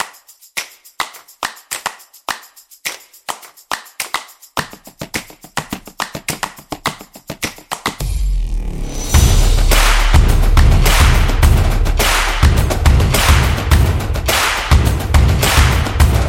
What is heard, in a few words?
The Claps Fun, percussive; percussive nation vol 3